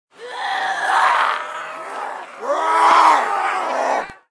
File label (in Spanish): zombies gritando